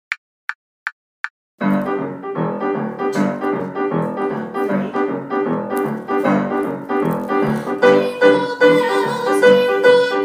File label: Jingle Bells vocal guide; carols backing track